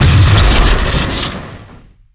reserve shooter crit; tf2

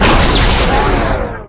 walker small death; Garry's Mod; omnidroids pack 1

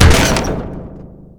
bumper car hit; tf2

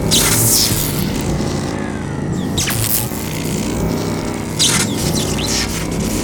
hologram malfunction; Garry's Mod; tf2

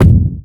giant step; zoonomaly cat titan

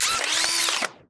spawn; Garry's Mod; labubu mecha

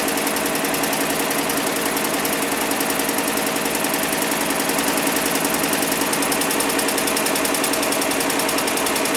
generator; Garry's Mod; tf2

